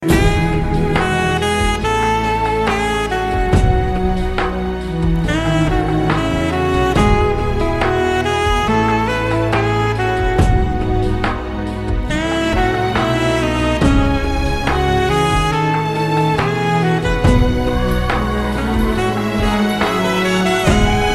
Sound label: Best Violin Ever